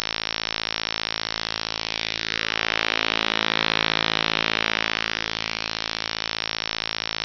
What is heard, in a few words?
Photo Spectrograms